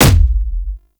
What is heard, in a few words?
Explosion Punch Generic Large; Explosion Punch Layers; Weapons